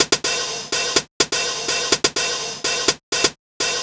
drum bust hat 125, Classic Chicago House Drum Loops